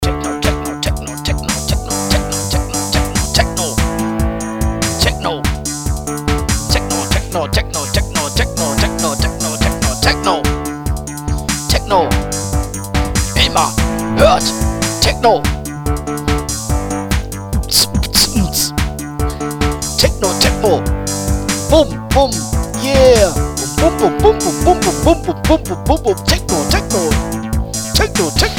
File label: techno